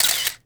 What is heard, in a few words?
Camera; Matt Hoffman's Pro BMX 2; XBOX